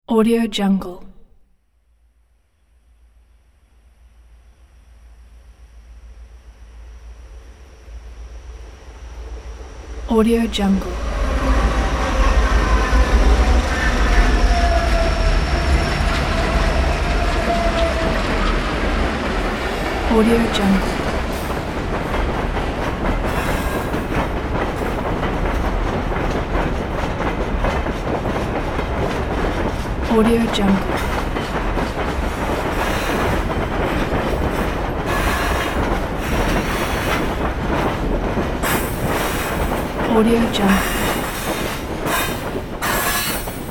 diesel train pass by with wheels

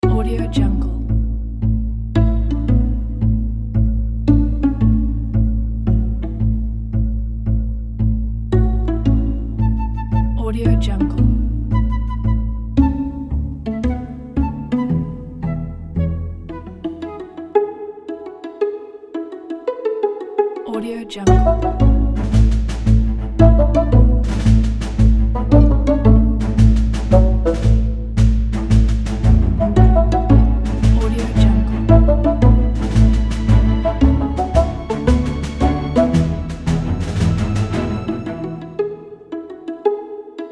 comedy symphonic

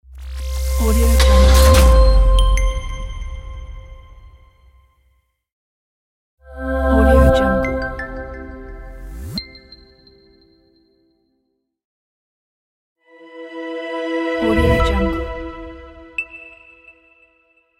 short advertising logo pack

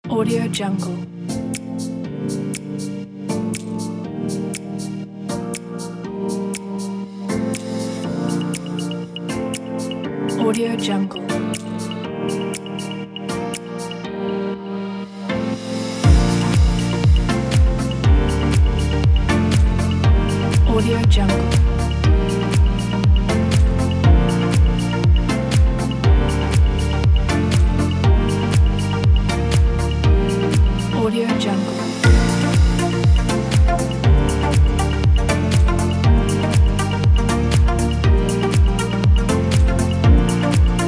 fashion ambient corporate kit

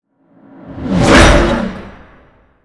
cinematic whoosh with metal hit

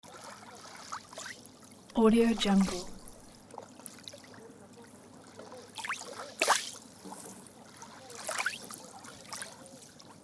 sea waves village ambience

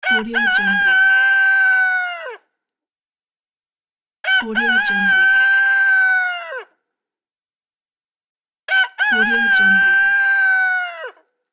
rooster

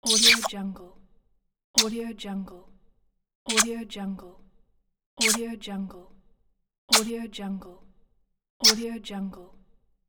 kisses

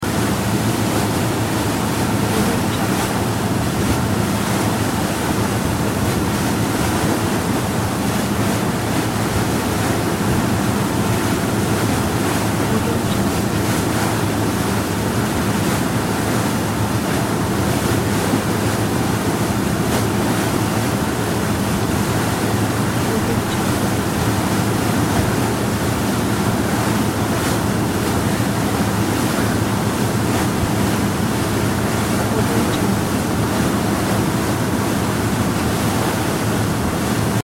medium sized yacht drive loop

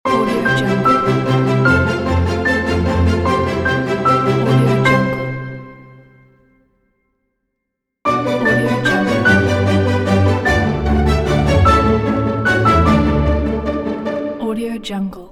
holiday strings logos pack; redfoxpro

